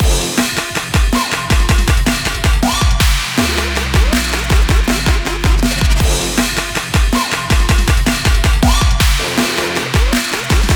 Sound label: Loop; Lost Epic